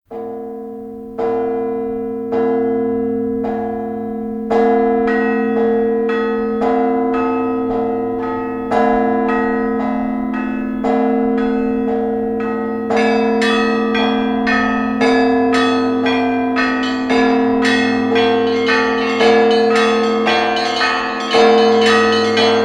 Русские Традиционные Колокол Московский Звон; Псково-Печерск

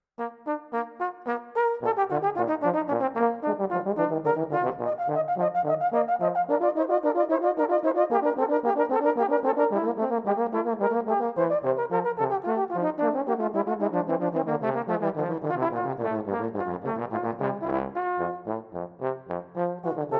тромбон; ИНВЕНЦИЯ-8 F-dur BWV 779; БАХ ИС

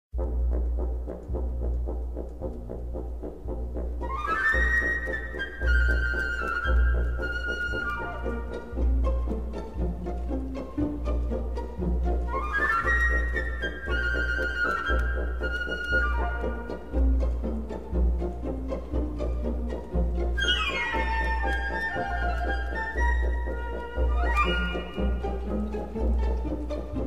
Щелкунчик Китайский танец Чай; Угадай-ка 4 класс; П И Чейковский

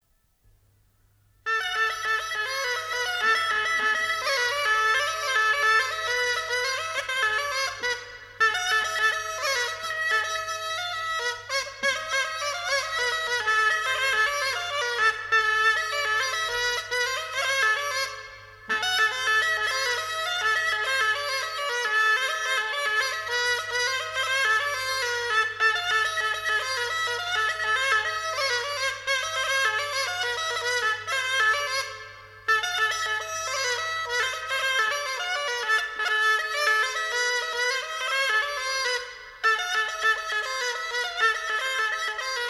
рожок, село Илёк Беловский район Курская область; Тимоня

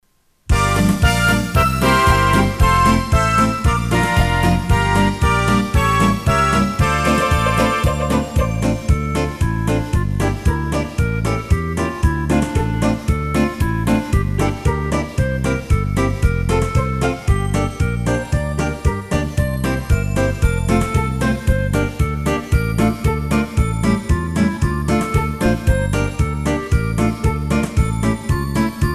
Новые детские песни (караоке)

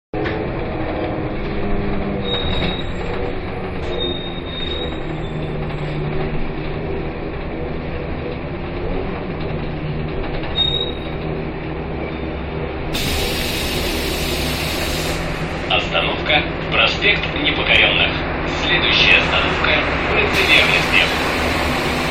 автобус 102 маршрут; СПб; Проспект Непокорённых — Улица Верности

